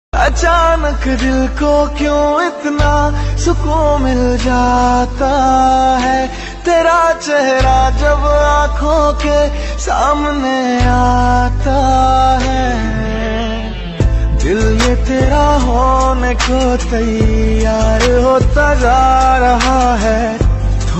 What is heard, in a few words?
Hindi Filmi Ringtone; ringtones